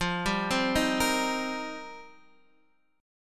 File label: F 7th Suspended 2nd Suspended 4th, Guitar Open D